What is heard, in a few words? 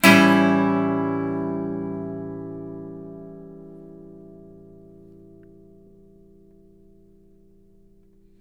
Blues Guitar, chords